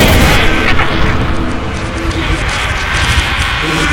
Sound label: on, weapons